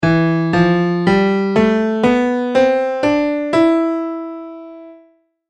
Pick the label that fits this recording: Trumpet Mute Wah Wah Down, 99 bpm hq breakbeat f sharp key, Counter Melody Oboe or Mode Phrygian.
Mode Phrygian